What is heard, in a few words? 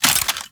Rifle Reload; Warframe; Weapons; Thanotech